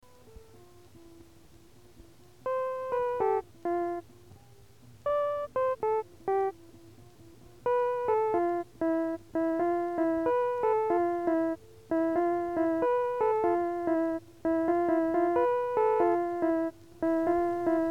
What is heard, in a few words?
Untitled Dub Piano